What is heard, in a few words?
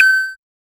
short percussive impact s, chiptune